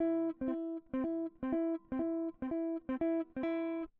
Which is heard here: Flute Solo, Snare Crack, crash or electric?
electric